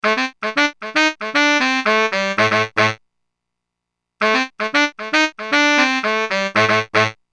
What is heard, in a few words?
S750 bariton Sax